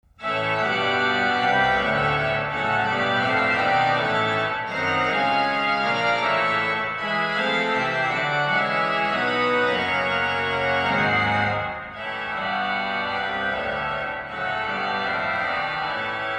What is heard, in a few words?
Postlude Deo Gratias, concert organ; ordinary pieces